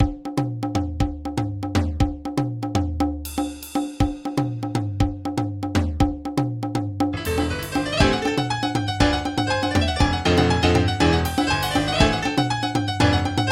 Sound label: Jazz